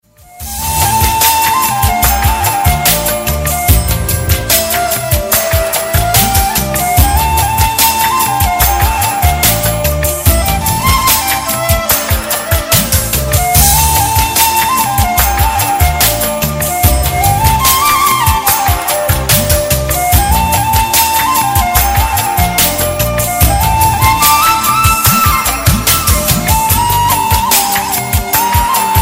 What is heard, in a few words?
Cute Flute